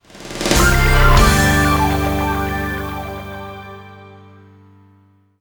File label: jingles; virtua quest